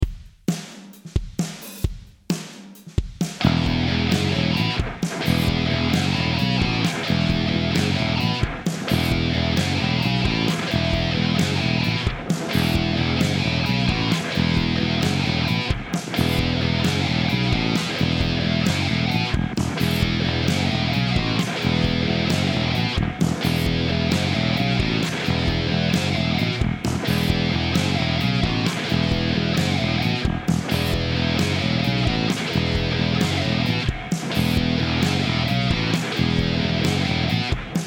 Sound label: riff